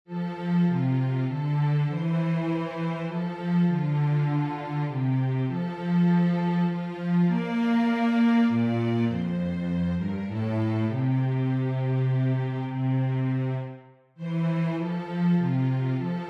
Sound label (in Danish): bas; julen har bragt